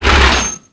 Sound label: tread fixed; tank repair; World of Tanks Blitz